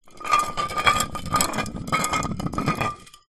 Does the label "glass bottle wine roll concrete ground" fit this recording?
Yes